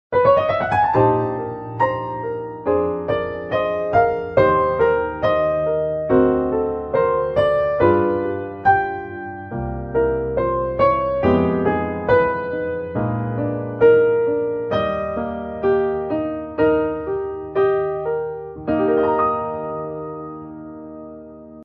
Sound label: heartfelt piano